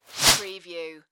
Whip Movement Fast; Sound Effects Bible

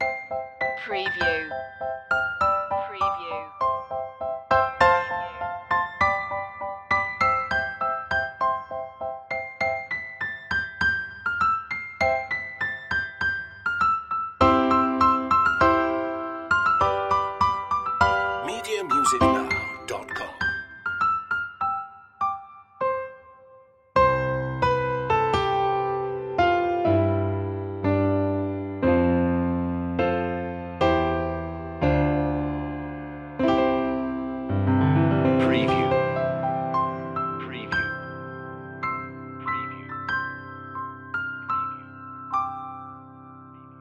joy to the world piano loop end; media music now

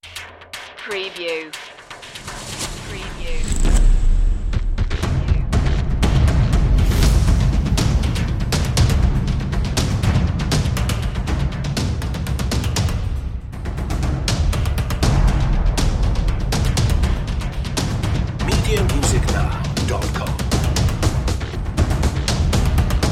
perc only

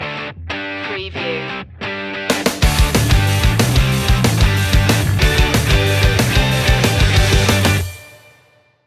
american summer stinger

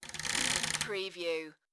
Creak Short

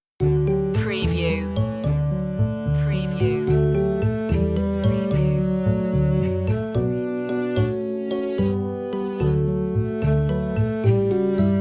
hickory dickory dock instr

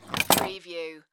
Pinball sound, ball slide then land and clank

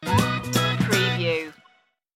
game of jax stinger

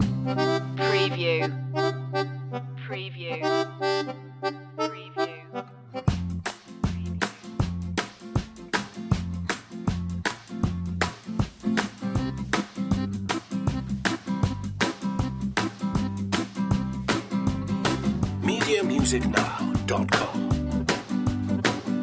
zydeco loop

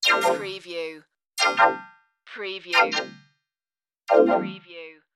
message popup notifications

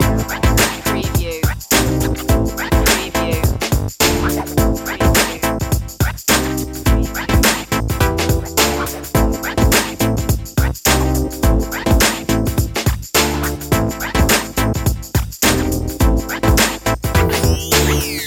hip hop chicago loop

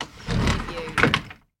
Closet Door Open

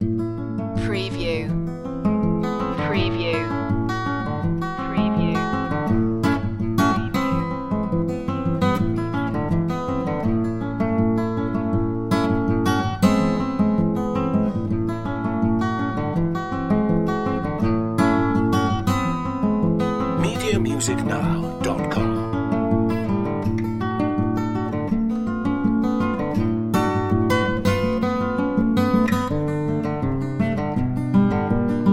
acoustic guitar only

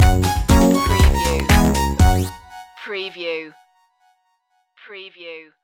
retro video game tomorrowland stinger

